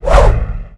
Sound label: tail, dragon